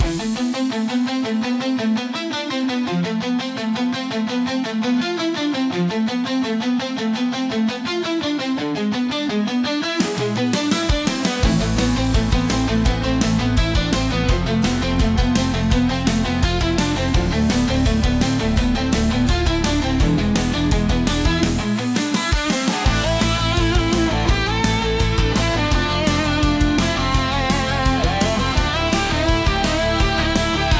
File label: hard rock